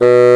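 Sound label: bassoon B2; East West - Global Sounds; WOODS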